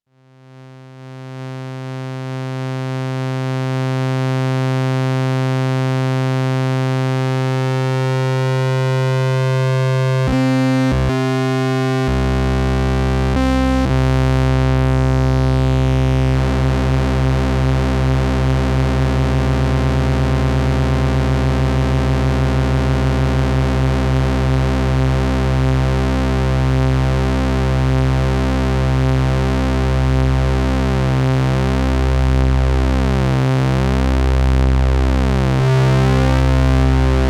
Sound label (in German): Oszillatoren Sub 37